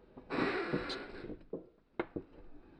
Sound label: house creak; ambient; inferno